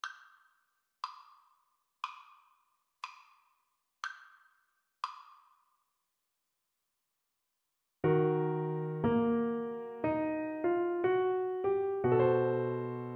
happy jazzy birthday, Tenor Saxophone

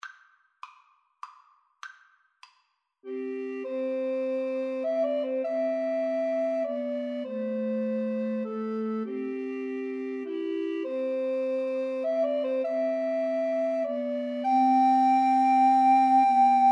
amazing grace, Recorder Trio

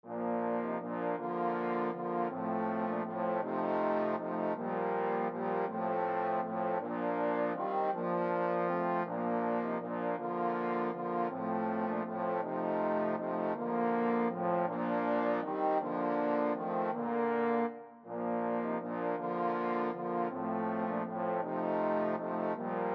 sonata, trombone quartet

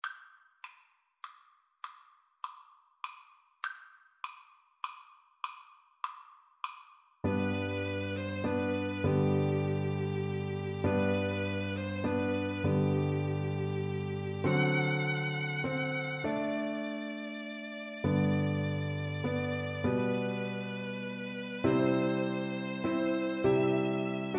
Piano Trio

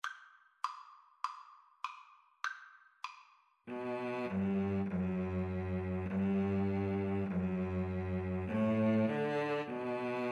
Cello Duet

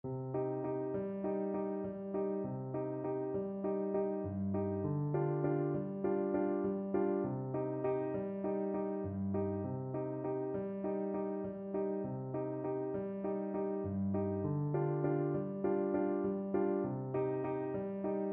buenos aires, clarinet

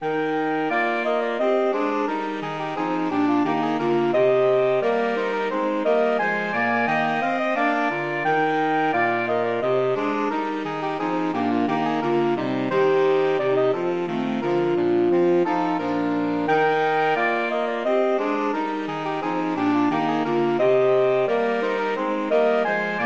hornpipe, saxophone quartet

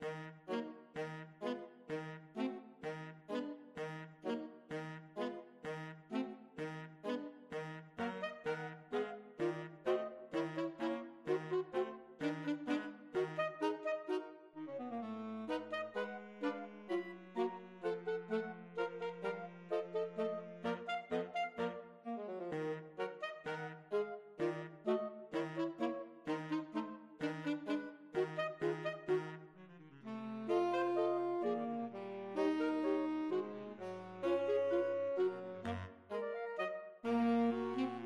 saxophone quartet; tchaik sugar plum easier

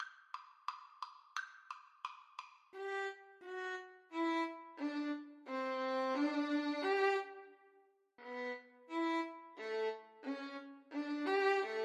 Violin Duet

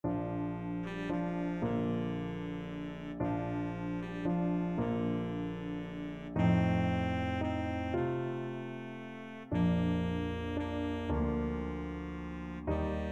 baritone saxophone

